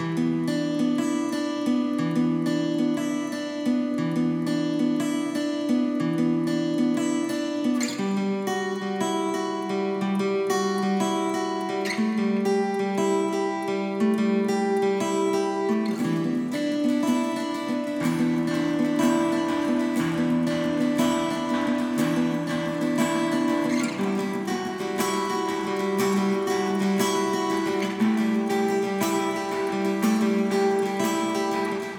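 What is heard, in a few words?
Guitar Groove 120 BPM 4/4; enchanted forest fantasy rpg kit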